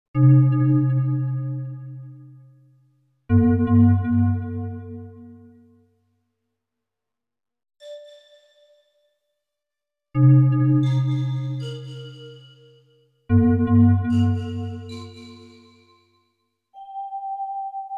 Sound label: Waterfall Cave; Lanayru Cave; skyward sword